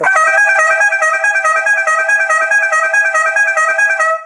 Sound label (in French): son klaxon; tour de france